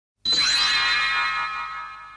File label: alert